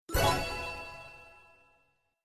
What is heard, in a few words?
win star